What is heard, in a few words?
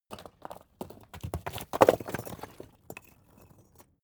stone large fall